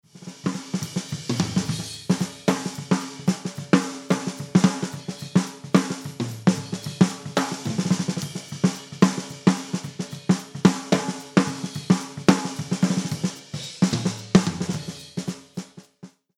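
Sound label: Mix Drums snare drum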